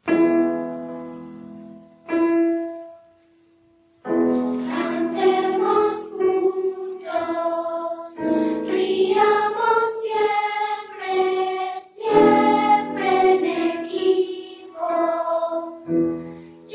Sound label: Exercise Singing together